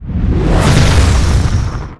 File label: skill shot fire; SkillSound